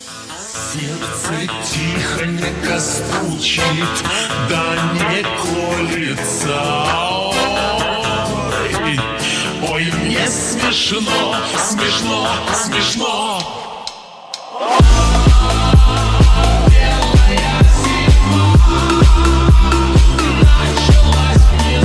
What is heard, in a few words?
Phonk remix